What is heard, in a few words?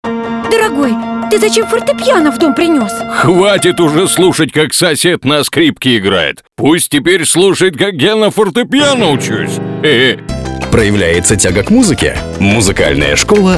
Fortepiano